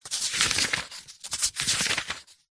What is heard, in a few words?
book large thick page turn; zapsplat